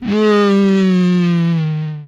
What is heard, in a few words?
Falling, Vocal; retro arcade; MMRetroArcadeSoundsPack